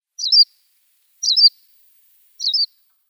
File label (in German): Kanarenpieper Anthus berthelotii; Vogelstimmen